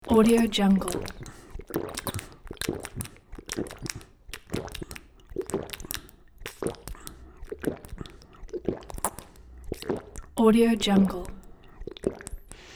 human drinking water

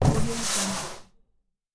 large empty cardboard box drag

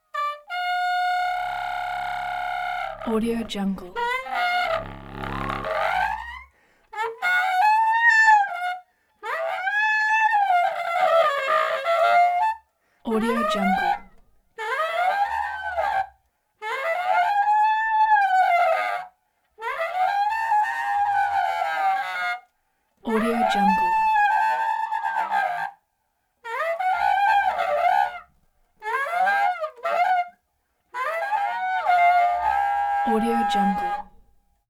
contrabass clarinet gliss runs low to high

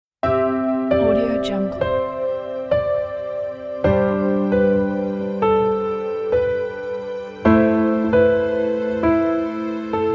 the corporate theme

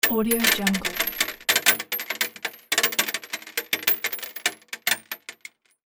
dropping bullet casings onto metal

